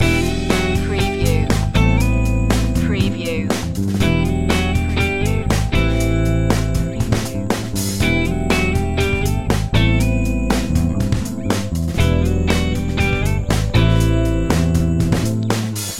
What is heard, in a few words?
funky strut loop